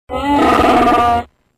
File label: Scream Sound Effect; Chewbacca